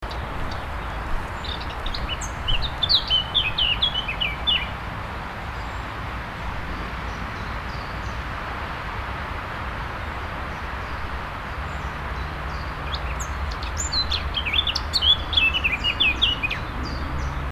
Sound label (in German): vogel heimisch; biologie; hecke; april